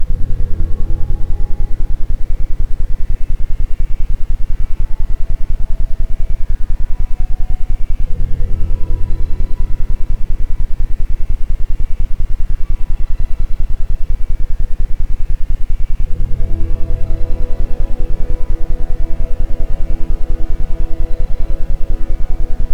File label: isochronic, Ambient Sound; Energie Wach; isochrone toene